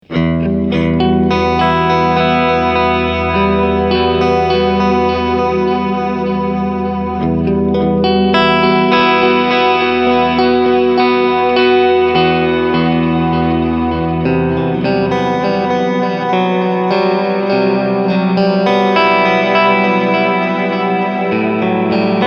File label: Wash